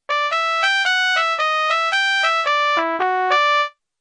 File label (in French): Trompette solo